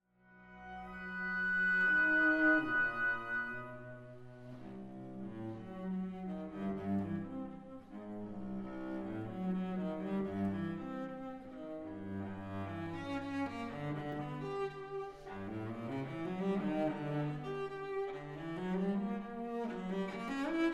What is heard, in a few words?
Cello Cadenza